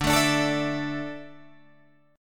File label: D Suspended 2nd D down Guitar Drop D